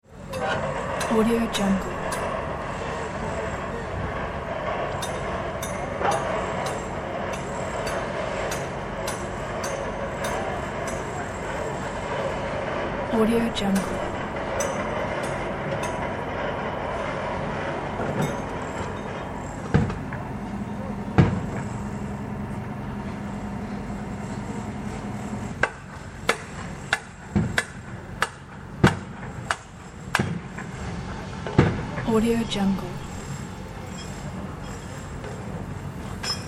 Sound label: construction site